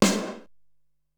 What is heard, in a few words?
Room Snare, Ensoniq SQ-R-Plus